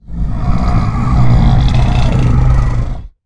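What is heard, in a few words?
Stealth Attack Start; Evolve; Goliath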